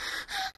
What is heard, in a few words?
Moan